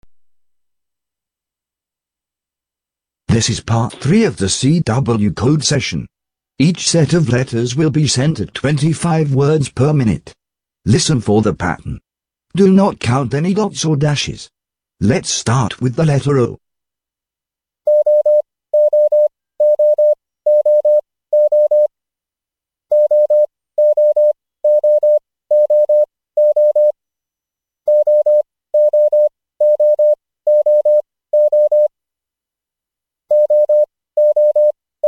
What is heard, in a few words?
cw training